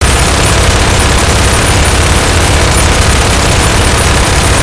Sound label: fireplayerloop; turrets; gow